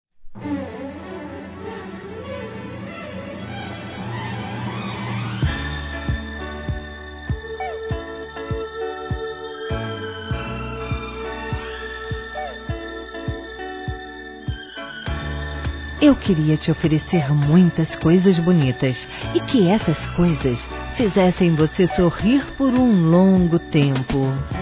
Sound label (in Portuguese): CONQUISTA F; Conquistas Cantadas paqueras; fem; Telemensagens; Telemensagens 2017